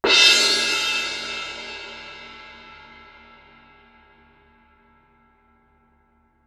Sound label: crash mallet ff, Suspended Cymbals; Percussion